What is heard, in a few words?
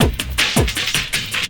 Loop, Drum & Bass, 160 BPM